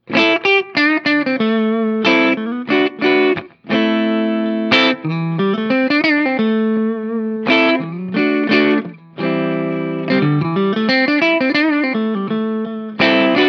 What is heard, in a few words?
neck and bridge pu